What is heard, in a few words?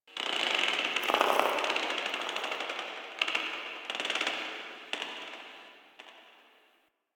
Covered Vine Area Creak; Ambience; Misc Outdoor; DE; Warframe; Infested Eris